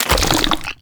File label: Step Sludge, Footsteps, Walk; Warframe; Tenno